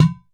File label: WATER DR, percussion; drum